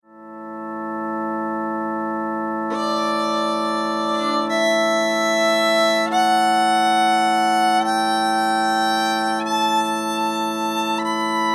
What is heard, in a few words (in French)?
majeur